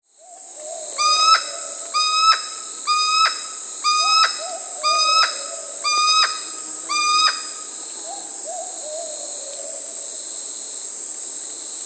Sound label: Curl-crested Aracari (Pteroglossus beauharnaesii), Peru, Birds of the Amazon; S. America